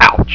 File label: ouch; Gold Digger 1.2.1; Arcade